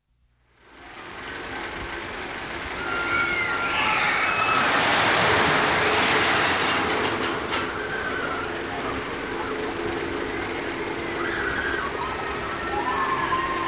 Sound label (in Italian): FOLLA LUNAPARK